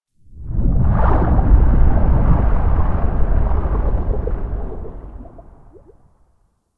Stalker Cave Underwater Whoosh; Ambience; Warframe

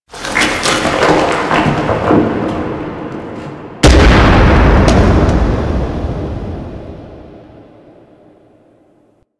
Moon Bolder Distant; Ambience; Warframe; Orokin Moon

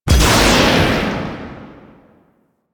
Spear Throw Impact; BE Ferrox; Spear Gun

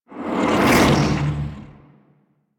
Power Blade Release; Cinematics; Shrine Intro; Warframe; Sacrifice; Umbra

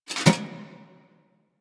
Investigate Putdown Helmet; Warframe; Cephalon Weave

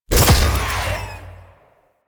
Mech Slide Stop; Nechro

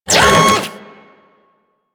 Thumper Bot Servo D; Warframe; Grineer

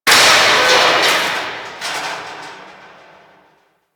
Bridge Break B; Warframe; Corpus Gas City

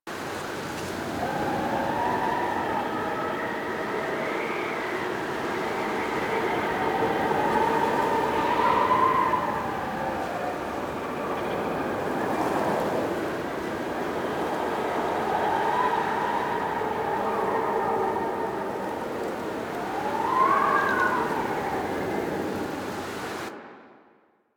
Cloud Jump Loop; Warframe; Monkey King

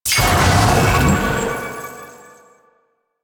Activate Tower Crystal; Warframe; The New War

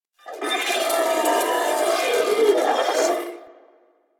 Sentient Symbilyst Idle; Enemies; Barks

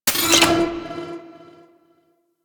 Menu Retrieve Drone, UI; Warframe

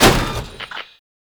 Electronics Misc Destroy

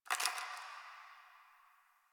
Ice Crunch; Warframe; Ambience; Nineteen Ninety Nine; DE

